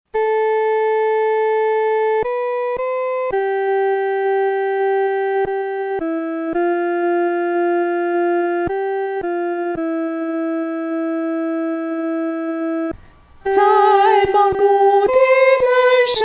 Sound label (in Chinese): 独唱 第一声; 微声盼望